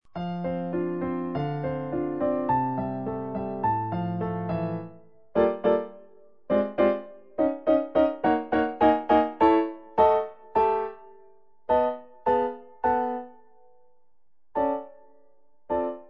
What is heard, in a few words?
Allegro moderato practice, Flute and Piano; Cooke; Sonatina